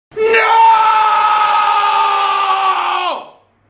noooooo; Dross

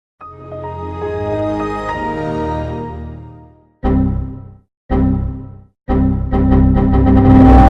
microsoft windows startup; made with Voicemod

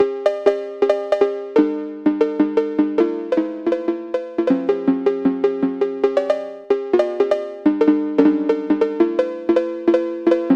PHONK; GAMING

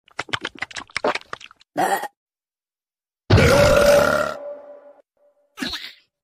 burp; Worms

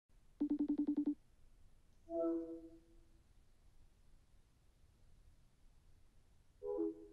facetime pick up sound

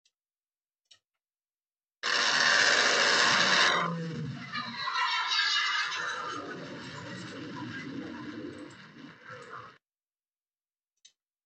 f 15c eagle strafe run

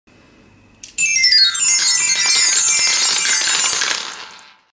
Satisfying glockenspiel sound glockenspiel asmr

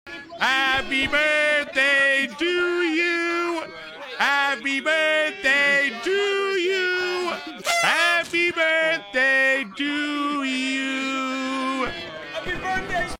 Singing Happy Birthday; Peanut

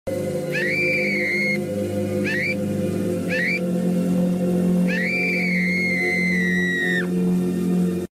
AHHH

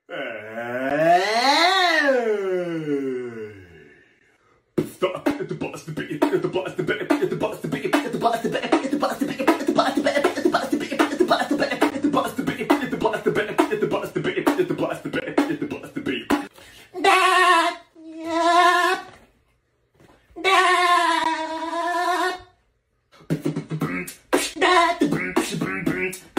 goofy ahh beatbox; verbalase

